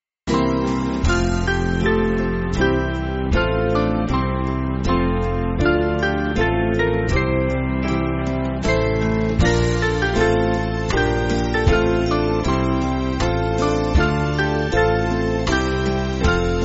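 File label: Band, Backing Files for Churches; O Son Of God In Galilee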